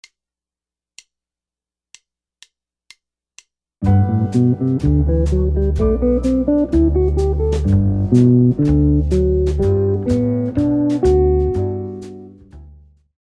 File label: maj7 arpeggios, guitar